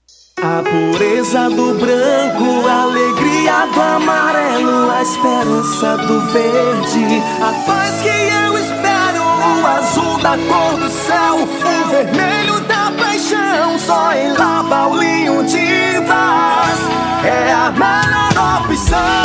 jingle tintas; paulinho tintas; kazamstudio

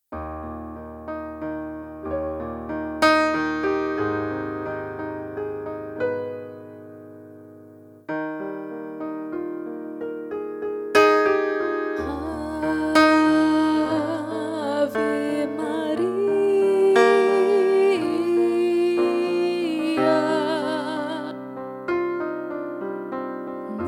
ave maria natureza

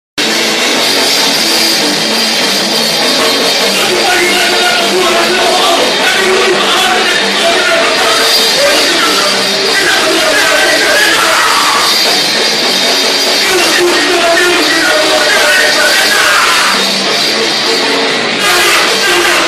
punk cover

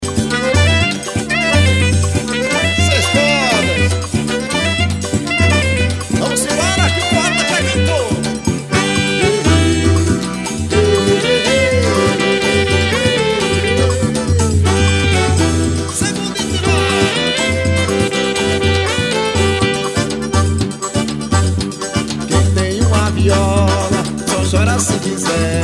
seis cordas

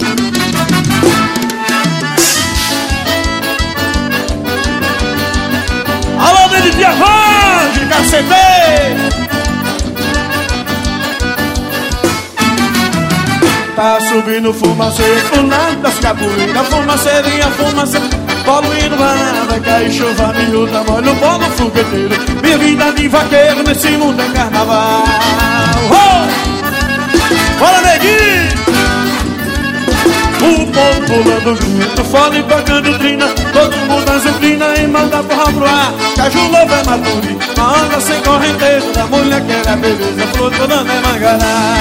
chuva miuda ao vivo

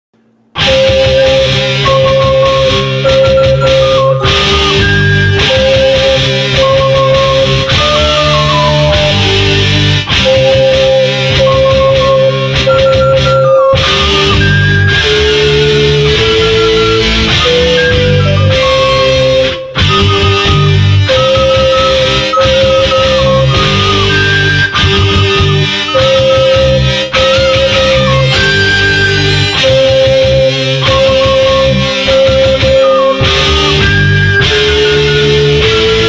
flautas; clarinadas

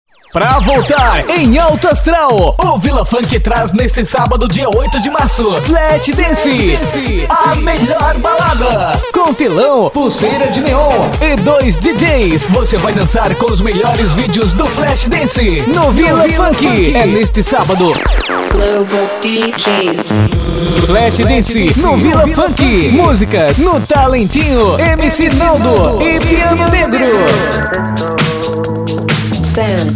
comercial funk; vila funk

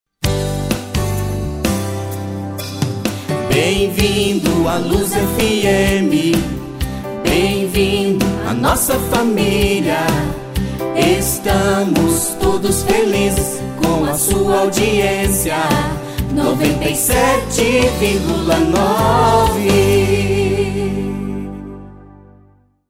dingle da radio

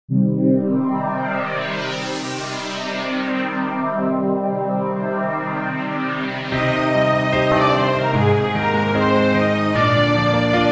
zamba instrumental

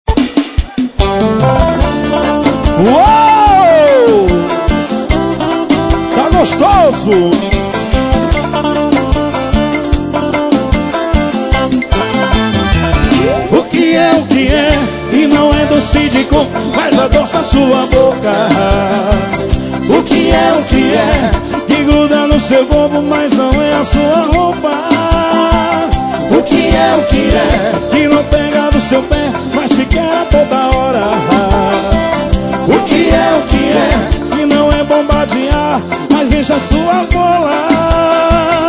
forro

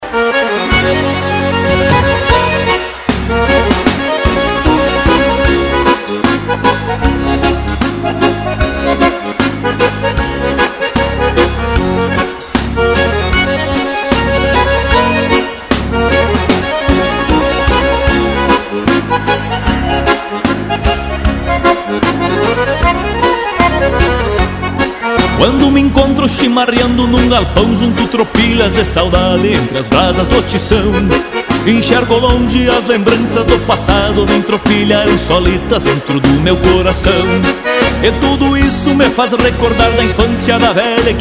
xote

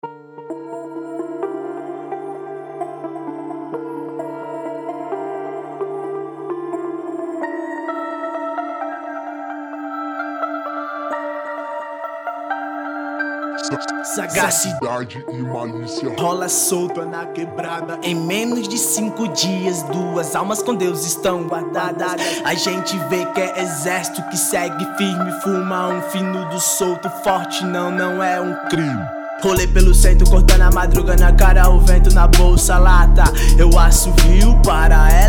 rap nacional

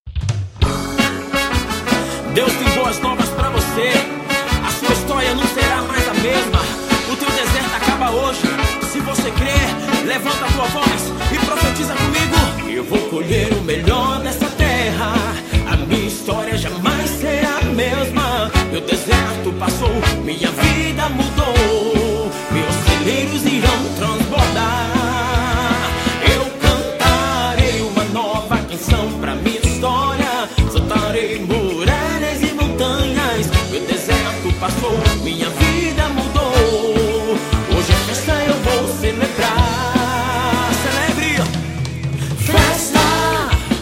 dia de festa

